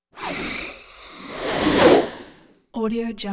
cloth whooshes sharp whooshes; transitions and movement

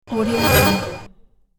quick magic subtle air pulse swoosh; transitions and movement; نبض هوایی جادویی

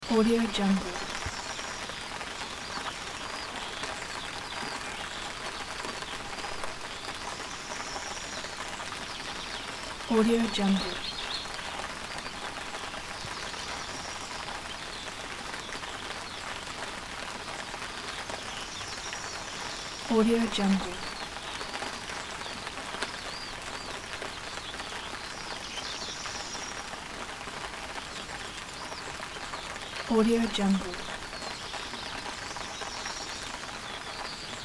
rain under big leaves with birds loop; بارش باران روی برگ های بزرگ

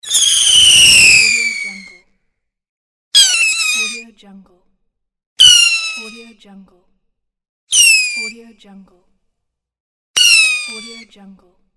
firework whistling; urban sounds

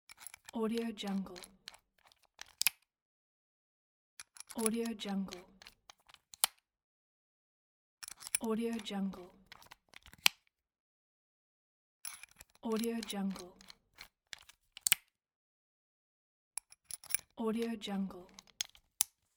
cordless drill inserting drill bit; urban sounds

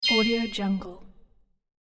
interface infographics arp bells up; interface sounds

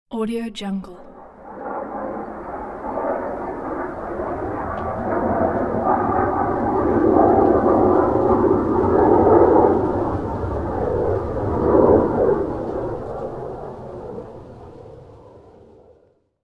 fighter jet plane distant flyby

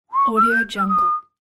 casual whistling; سوت بی تفاوت بودن; misc game sounds

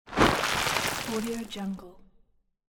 fishing net; domestic sounds

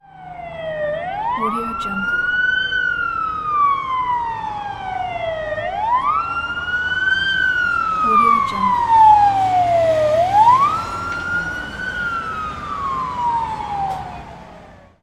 ambulance or police siren in traffic; urban sounds

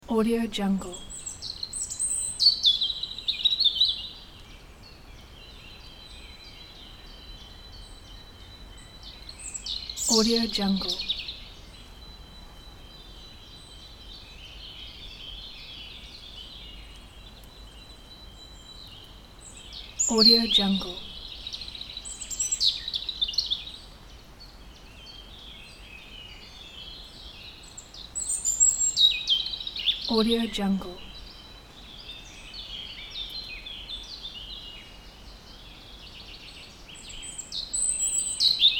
summer forest ambience with birds loop; جنگل در تابستان

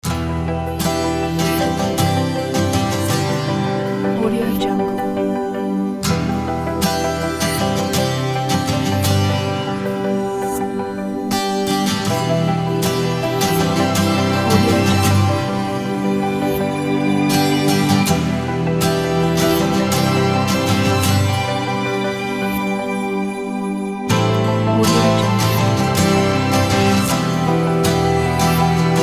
indie acoustic calm documentary background; پس زمینه آکوستیک; موسیقی بی کلام

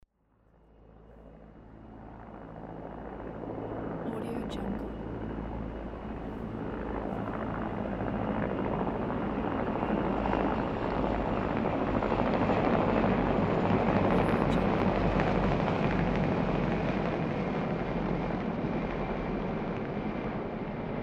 four military helicopters flying by

